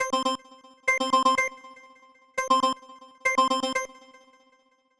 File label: Hammer, ringtones; Samsung; Galaxy M20